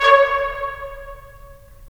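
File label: brass trumpets staccato, right; Roland LA Composer vol. 4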